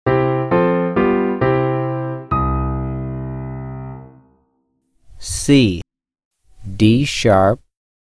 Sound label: C1 D#5 Grandeur Piano 2 Note Classic; ear training two note; Level Three “Classic” Example